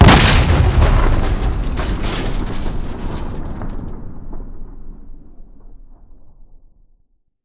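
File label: Reaper Nuke Destruct Explosion Close; Titanfall 2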